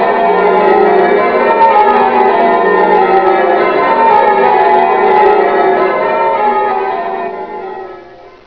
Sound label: rounds, ringers